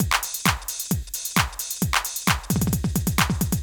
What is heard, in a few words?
Beat Mix, 132bpm; Construction Kits; Big Fish Audio - Old Skool House; OSH Belgium Beats